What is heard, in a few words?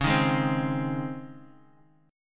Db Major 7th Suspended 2nd Db; DbM7sus2 strummed; JGuitar